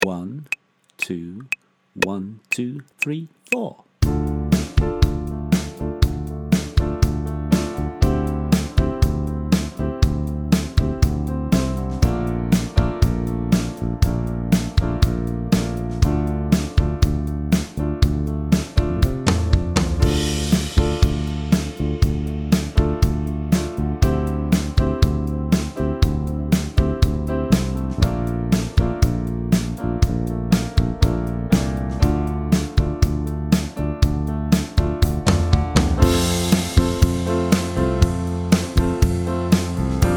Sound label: Making Music BACKING TRACK